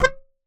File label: Melodica Short Note